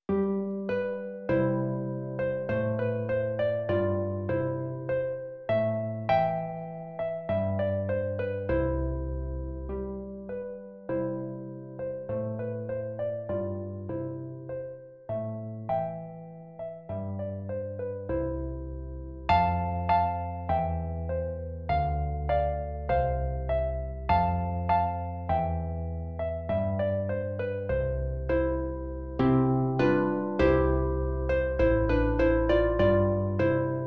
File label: Carols for Solo Harp